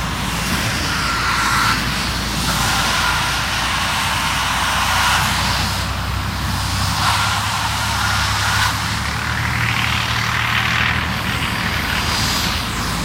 main rotor damage layer; engines; War Thunder

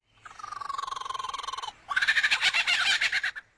rock birds; World of Tanks Blitz

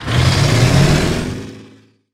Warrior Engine Acc Start; DCS

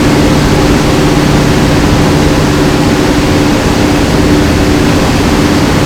c130 engine close right, vehicles; Call of Duty Modern Warfare

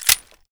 grach slider out; Escape from Tarkov

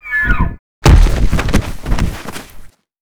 death; Rust

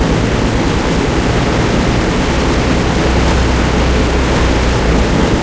stug40 engine int rpm 66, tanks; War Thunder

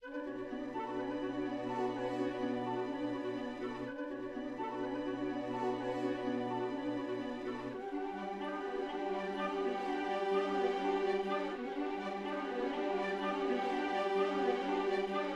music interactive; World of Tanks

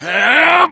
melee charge, voiceovers

